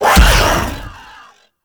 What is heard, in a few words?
Whirlwind Dash Impact A; Warframe; Warframes; Caliban